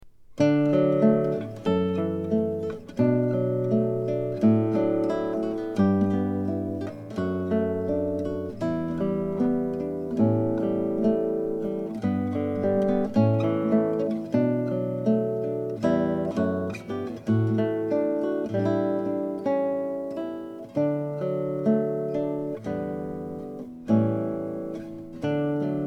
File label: Acoustic Guitar; Cocktail and Dinner Music